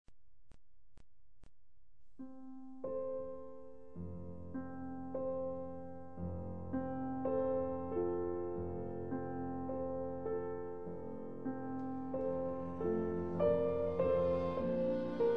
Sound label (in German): klavierquintett satz